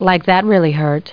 HURT

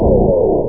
warning low

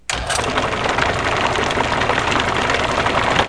Paint Mixer; Construction

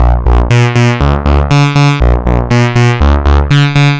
120 SIMPLE C1, Electro Crash; maudio

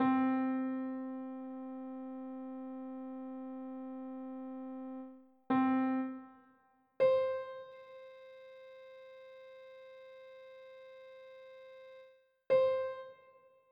test decay

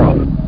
Turret Fire; Grid Warrior 1.2.6 Demo

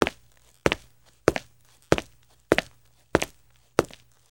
footsteps; rightmark-3dsound

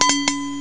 bell; graal

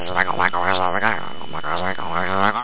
grumble

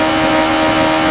Suz Hi Lp, Engines; SuperBike2001

